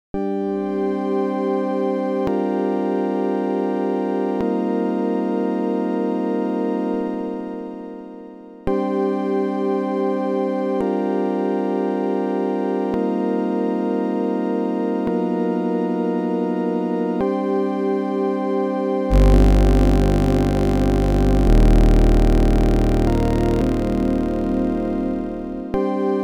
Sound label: drone opz; weeklybeats 2024